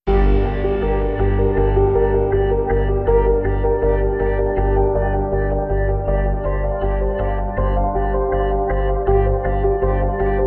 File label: No Trumpet Melody